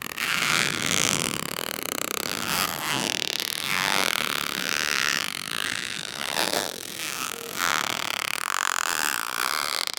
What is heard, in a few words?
leather stretched very sl, creak